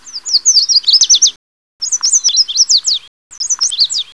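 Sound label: Yel WARBLER, yellow warbler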